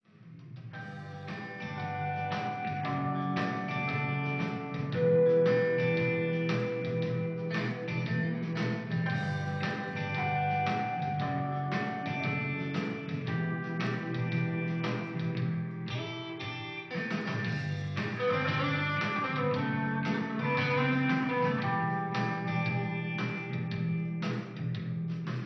Rock; Usen; Fist of the North Star Lost Paradise; Hokuto ga Gotoku; PlayStation 4 gamerip

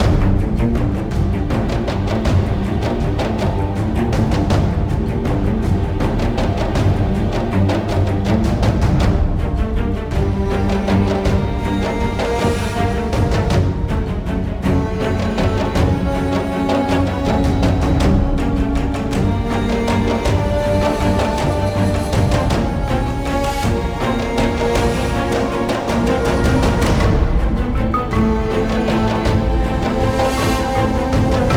Boss Fight

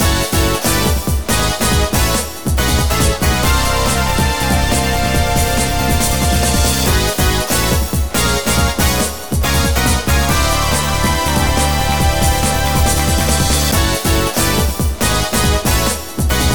Goal Replay Great Goal

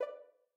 Click toggle; MiSide Demo

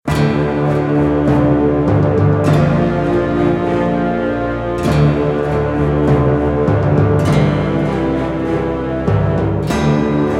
Mission Failed

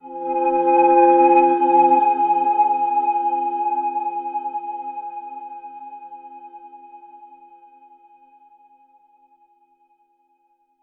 Backing; Arc Apellago

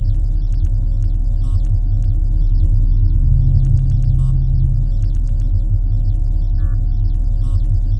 Shop ambient; Huge Enemy Worldbreakers

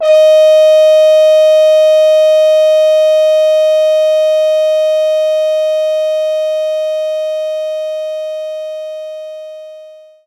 French Horn D#4

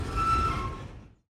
Train Stop

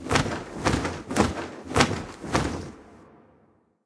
Dragon flight Flight

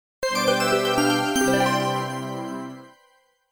JINGLE3 (Get Ending Moment); Idol Janshi Suchie-Pai Collection

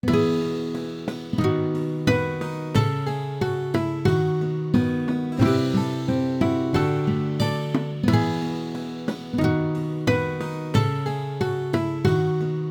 Horse Racing - Cup - Results; mario sports superstars 2017 3ds gamerip

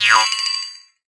Spring Dropped

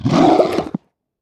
Fishmen VO Attack; we are the dwarves gamerip 2016

